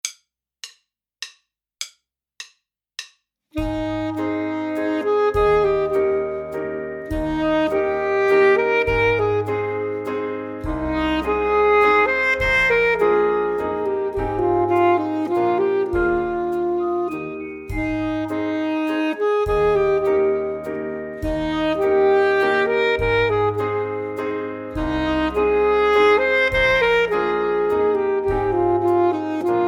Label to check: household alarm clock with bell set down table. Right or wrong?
Wrong